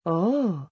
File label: "oooh"